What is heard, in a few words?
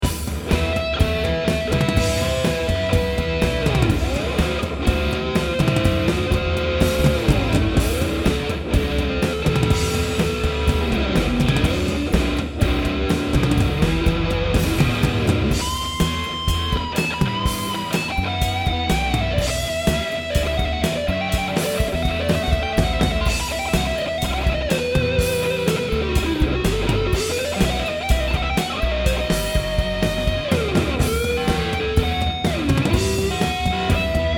solo